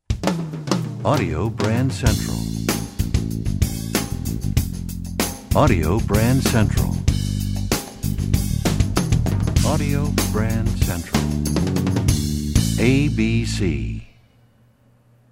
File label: Desert Wind, radio jingles